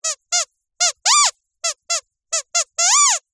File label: leisure toy squeaky squeeze sequence